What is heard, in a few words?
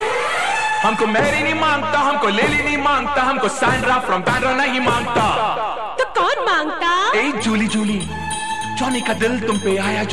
Is Calling Rap